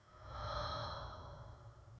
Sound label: ghost